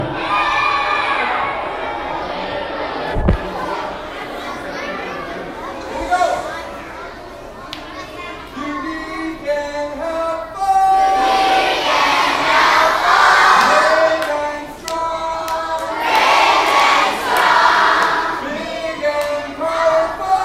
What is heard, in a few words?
Kids anthem; dearborn park seahawks